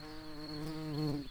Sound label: bumblebees, Pascuorum queens